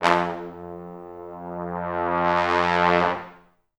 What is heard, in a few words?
Pop Brass; Best Service ProSamples vol.19; AKAI